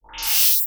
Electric dis splash

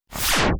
Sci fi slide

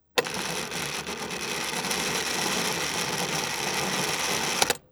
Winch release

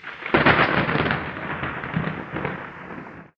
Lightning

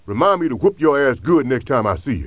whoop; South Park

